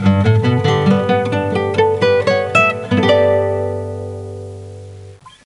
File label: Jingle Normal; tamagotchi connection corner shop 2 ds